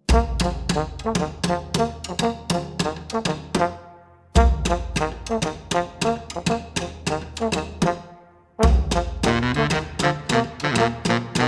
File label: BRASS BAND